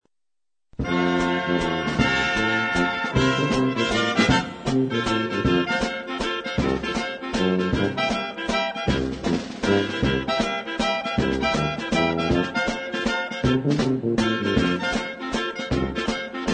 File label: ALPINE LAENDLER